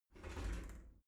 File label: shower slide door slide close